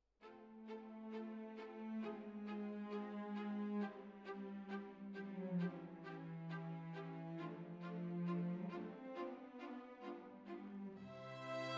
Violins, jazz for piano

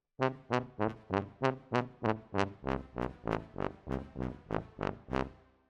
Tuba, jazz for piano

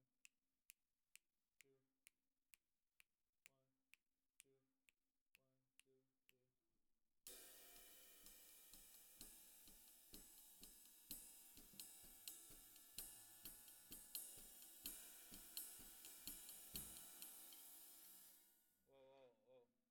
SNR

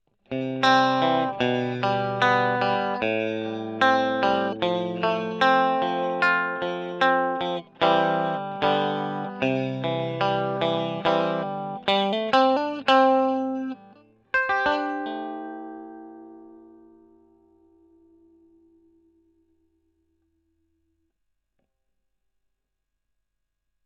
E.GTR; Gefell

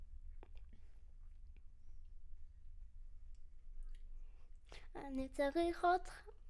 Children Choir; Studio Bekol Children Choir

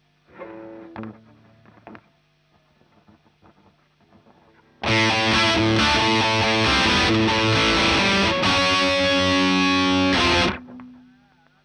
Marshal Gtr

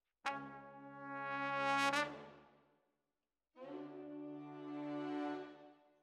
Trumpet

